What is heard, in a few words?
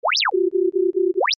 Computer Beep: Odd Effect; Communications; Communication Sound Effects; Communications (Sound Effects:Science Fiction); Stuart Duffield